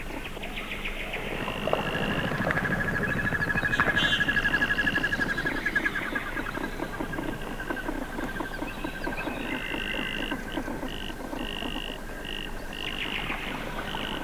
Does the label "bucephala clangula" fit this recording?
Yes